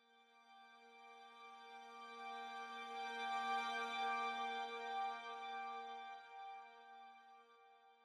Strings, cinematic drama Soundbeds; musicradar

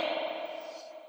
Tails, Drum Bits, Verb Tails; layering

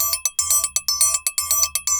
TRIANGLE, DRUMS SAMBA; Best Service ProSamples vol.14; AKAI

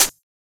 Closed HH

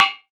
Panarus C#4

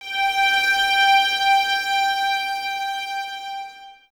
VNS G 5, Violins, String Ensembles; Miroslav Vitous

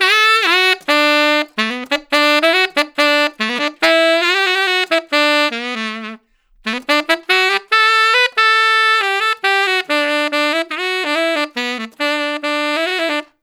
Tenor Solo, Jazz, Traditional, Tenor Sax

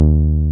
303, TB-303 BASS

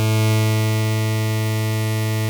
OB A3, VOX, Synthesizer; Hollywood Edge - Giorgio Moroder Rare Synthesizer Collection; OB 4 VOX.

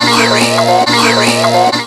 140 FRESH; USB Soundscan vol.20; Fresh Disco House I; AKAI; DISCO ADD

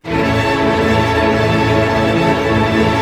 ARP A, STR ARP MAJ; Sound On Sound - Peter Siedleczek's Orchestra

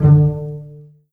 D3 PIZ F BAS, string; East West; The Ultimate String Collection

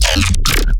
glitch stab; Virtual Riot; Glitch Stabs

